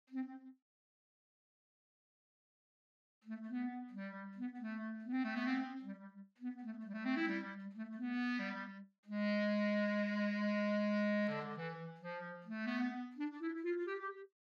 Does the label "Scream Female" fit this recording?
No